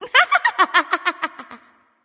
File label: laugh; Smite; Xmas Elf Nu Wa